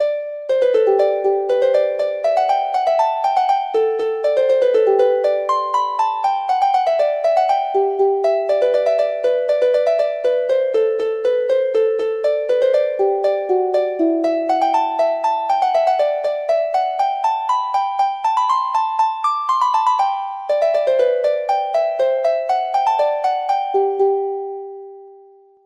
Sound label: harp